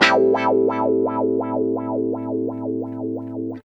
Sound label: GUITAR LICK; Ueberschall; Jam Box